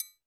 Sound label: percussion, Roland TD-20